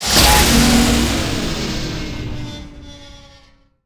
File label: broken door; trek